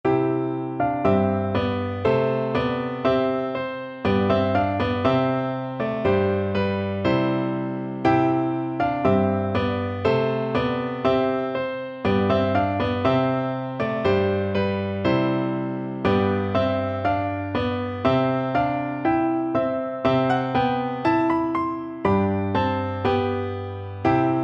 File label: deck the halls, piano duet